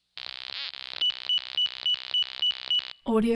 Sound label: burst of beeps buzzy squiggle; prosoundfx